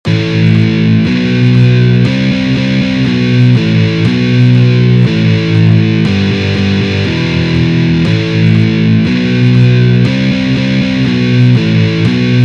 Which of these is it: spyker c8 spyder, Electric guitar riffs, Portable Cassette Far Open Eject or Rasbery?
Electric guitar riffs